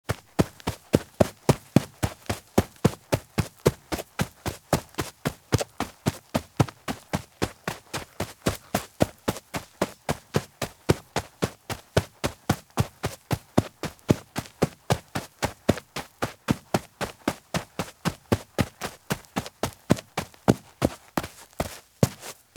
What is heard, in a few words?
Running Shoes Run on Sand; Sound Ideas; Footstep Sound Effects